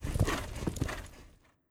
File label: Huntress Run Tiger Run; Legend of Keepers